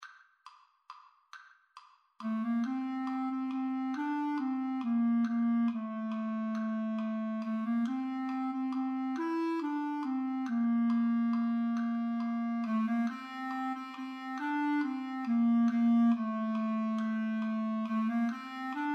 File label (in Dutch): Mexicaans volksliedje boven